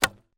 medieval scythian recurve arrow shoot from bow; still north media

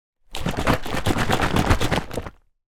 food drink milk carton shake

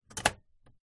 Cassette Open

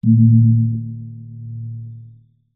musical instrument gong gamelan hit soft

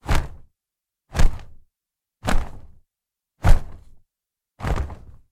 Cloth Swing Fast; noise creations

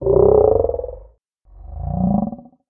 horror monster growl; sound effects one

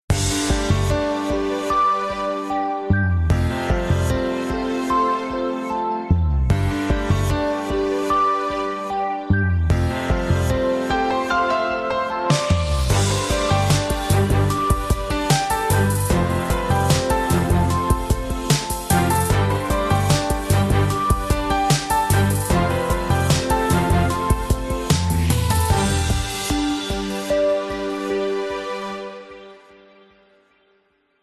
podcast theme; zapsplat

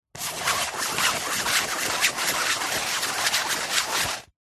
tin very small circular lid on spin; foley; zapsplat

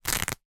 foley cloth denim rip

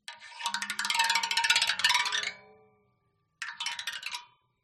waterphone bow scrape rods dynamic; aston stealth

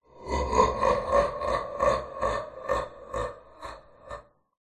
horror demon devil evil laugh processed; zapsplat

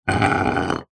industrial hammer claw scrape drag garage floor

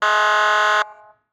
Police Siren Horn; Blastwave FX

